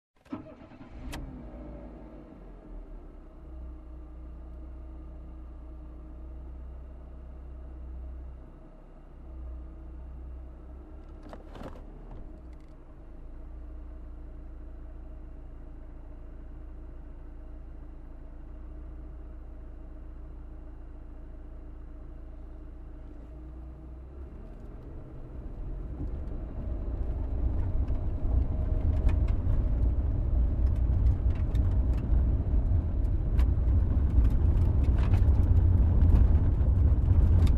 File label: Car ride on dirt road